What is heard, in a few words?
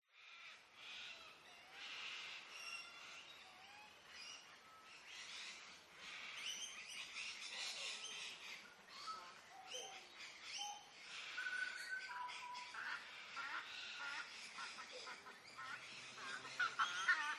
nature ambience lake birds ducks lorikeets swamphen distant traffic early morning; qld